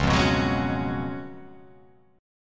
Db 11th, chord; strummed; JGuitar

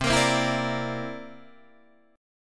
C 9th C down Guitar Open D

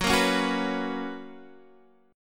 B Major 7th Suspended 2nd, chord

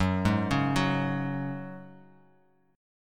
Gb Suspended 2nd, Bass Standard; arpeggiated; 14,11,11,11

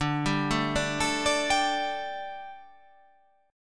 D Suspended 4th D

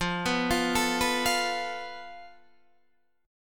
F Major Flat 5th, Guitar, DADGAD

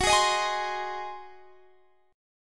Ab 7th Gb

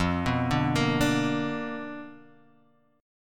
B Minor Major 7th Flat 5th, chord

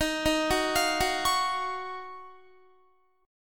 D sharp Minor 9th, Guitar; arpeggiated

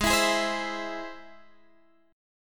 E Augmented G sharp, guitar

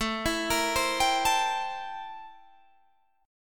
A-Minor 7th Flat 9th, chord

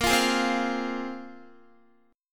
Bb 7th Suspended 2nd Bb, Guitar 4E 4A 5D 5G 5C 6F; Bb7sus2 strummed; x,13,15,13,12,12

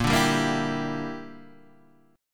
A sharp 6th Flat 5th, Guitar Standard; A#6b5 strummed; JGuitar

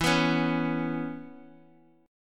E 5th 6th; E56 Chord {12 14 11 X X X} strummed

